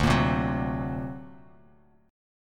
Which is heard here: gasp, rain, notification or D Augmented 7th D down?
D Augmented 7th D down